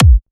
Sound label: Hardcore Kick